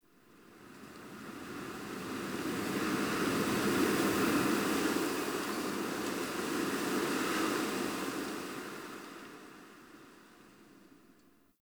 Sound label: Exterior Winter Wind One Off; Ambience; Warframe; NineteenNinetyNine